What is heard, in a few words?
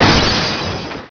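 glass; World Racing 2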